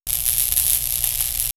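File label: Magnetic Field, electronic fx; X-Static Goldmine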